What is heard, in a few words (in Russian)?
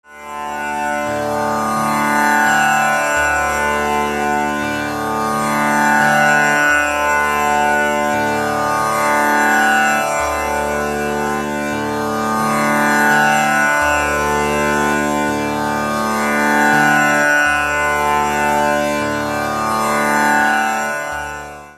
tampura, Индийские музыкальные инструменты тампура; Тампура, струнный щипковый инструмент, который сопровождает исполнение индийских музыкальн